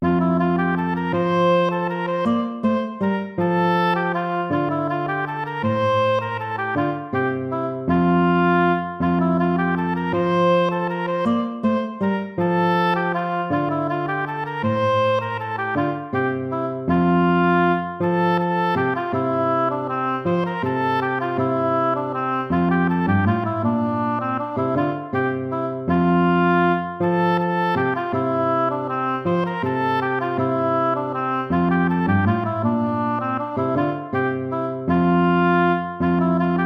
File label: piece for lute